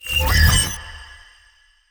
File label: Ice Spell Utility Unlocking; Arctic Aura